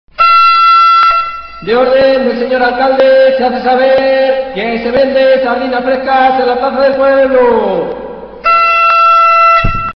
turuta; tradiberia

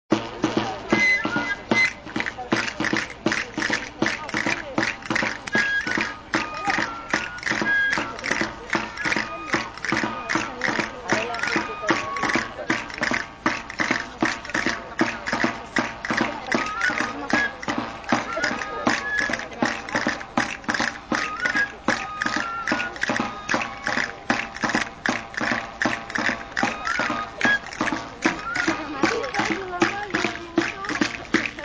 danza; valverde

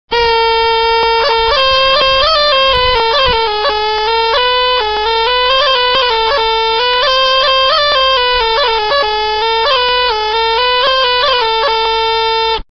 pito; tradiberia

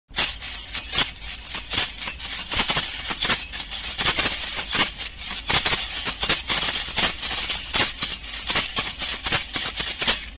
sonaja; tradiberia

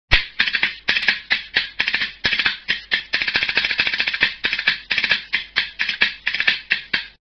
tejoleta; tradiberia

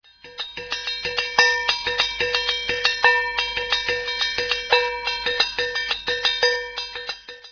sarten; tradiberia

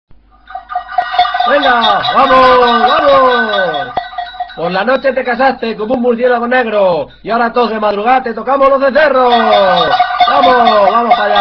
cencerro; tradiberia

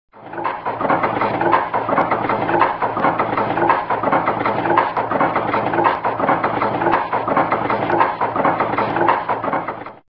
matraca; tradiberia

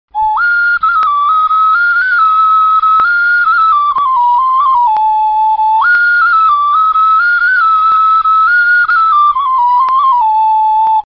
pito puro; tradiberia

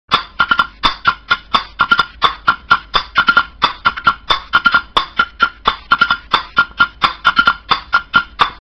mortero; tradiberia

